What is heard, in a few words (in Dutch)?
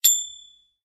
juist